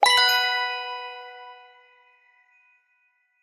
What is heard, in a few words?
succsess